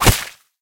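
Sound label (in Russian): свистящий удар штык ножом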